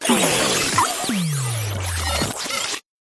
science fiction computer; Audio Mangler

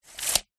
tape measure metal release retract; industrial; zapsplat

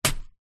horror knife stab head; zapsplat

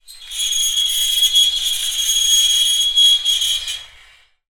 foley metal scrape shrill

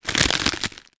food potato chips crisps crush in packet; zapsplat

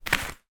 foley plastic art folder pick up from table

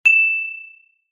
impacts metal ping high pitched small

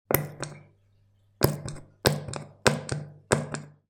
household soap dispenser push down several times; sound effects one

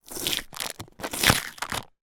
foley sticker security remove cardboard box

